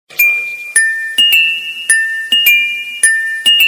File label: ting hit ringtone